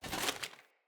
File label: Foley Action Armour Walk; Characters; Mother Armour